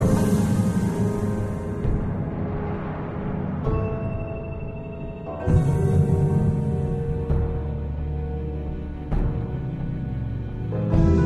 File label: Tense Loop